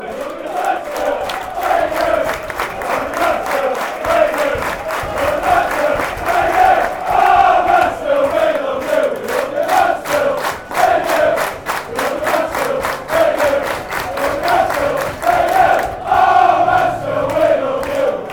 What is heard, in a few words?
we love you mansfield, chants